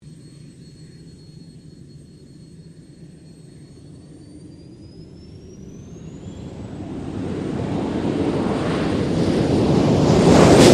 AIRCRAFT CESSNA A-37B DRAGONFLY TAKEOFF, Jet Fighter /Bomber; Airplanes